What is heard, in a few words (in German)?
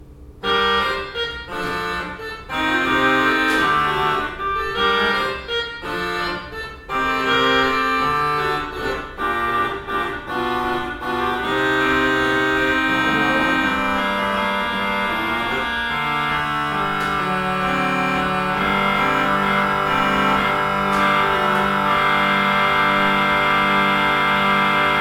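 Orgel, pfeifenorgeln; Kreienbrink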